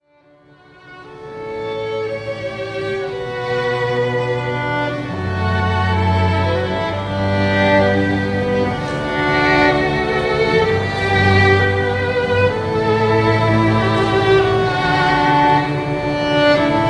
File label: canon